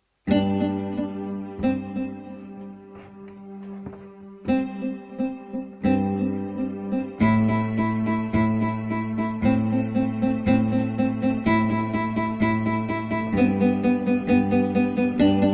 Spontaneous on synth; Dmitrij Soundbox

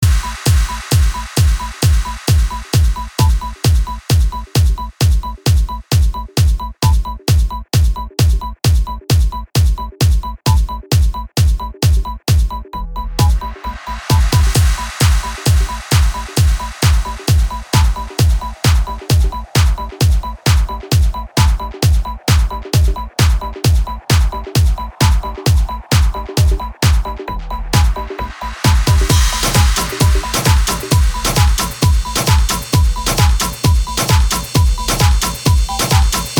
Jingle Bells, Latino Remix; James Pierpont; Jingle Bells 2010

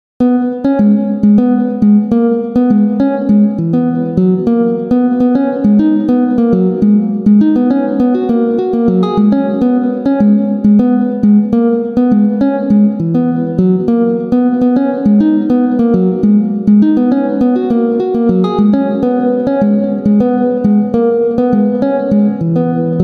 Acoustic

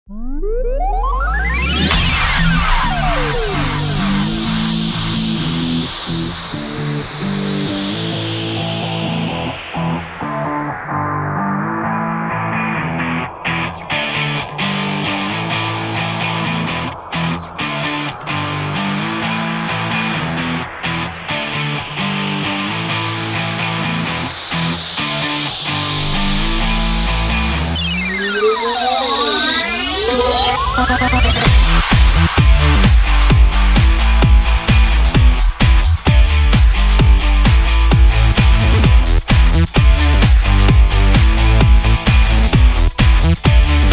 Mix beat

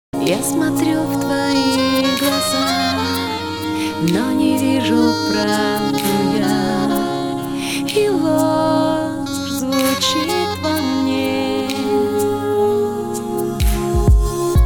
Chillout Mix

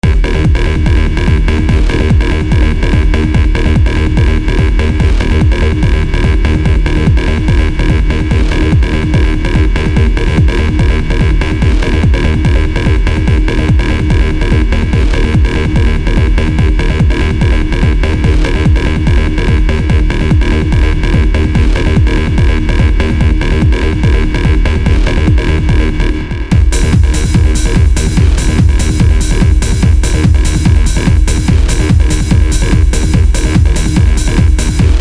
Psyhodelic wibes, Trance; Trance Engine Project

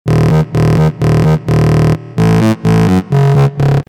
Nasty Bass

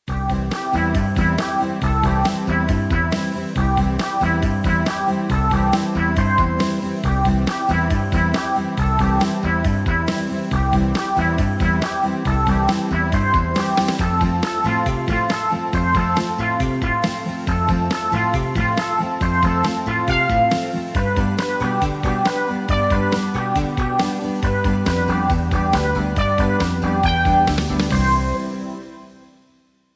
Comedy Background Music; Comedy History

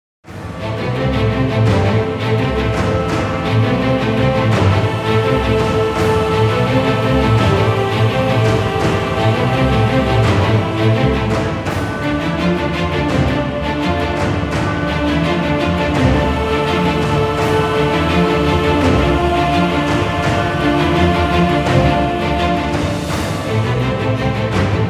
Epic Presentation Background Music

